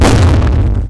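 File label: Cockpit damaged; PC Gamer IT CD 47; USAF; USAFDEMO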